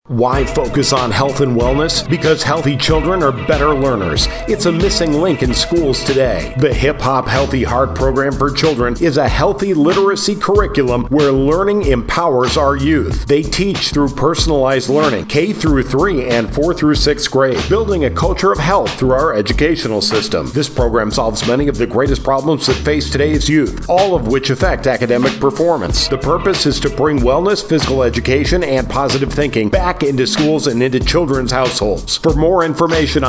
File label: Hip Hop Healthy Heart